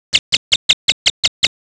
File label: crossbills flights